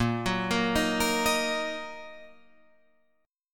Bb Suspended 4th Sharp 5th Bb down Guitar Drop D

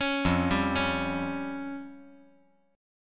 F Suspended 2nd Sharp 5th

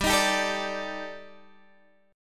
D sharp Major 7th Flat 5th G

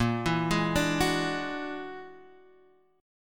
E 9th Flat 5th A sharp, guitar

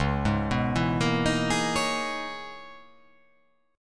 Db Minor 13th, chord; Dbm13 arpeggiated; JGuitar

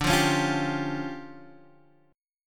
D-Minor Major 11th, D, Guitar, Standard; 10,8,11,10,8,x; DmM11 strummed

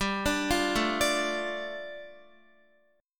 G 7th Suspended 2nd, Guitar; G7sus2 arpeggiated

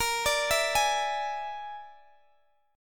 Bb 7th Flat 5th Bb Guitar Open G, chord; x,x,20,19,17,18

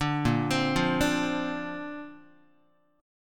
Bb Major Add 9th, Bb, down, Guitar; arpeggiated; JGuitar

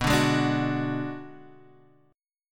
D 6th Flat 5th B

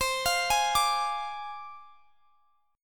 G sharp 6th Flat 5th C

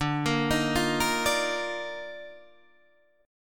D sharp Major 7th Suspended 2nd D

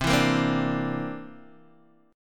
C 9th C down Guitar DADGAD

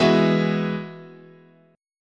E Major Add 9th, chord

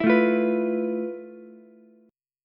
F Major Sharp 11th, Ukulele; strummed; JGuitar

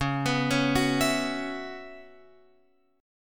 Db Minor 11th Db Guitar Open F; arpeggiated

